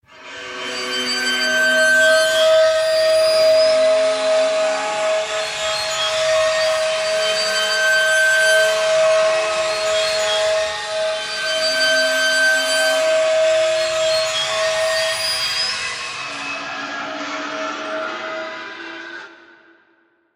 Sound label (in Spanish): Sonido brecha; Dead Synchronicity